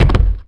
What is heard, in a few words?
Body Fall; Civ4 warlords